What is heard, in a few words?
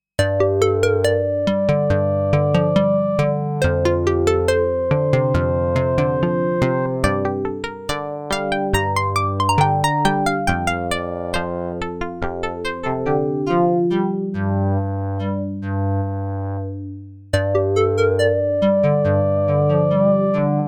Crystal, Sequential Prophet '08, factory program